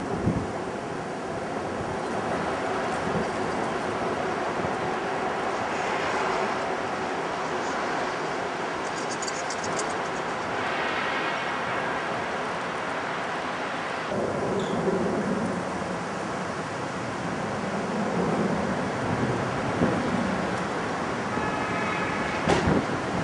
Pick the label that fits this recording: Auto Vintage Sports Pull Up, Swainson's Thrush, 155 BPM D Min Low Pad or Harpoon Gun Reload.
Swainson's Thrush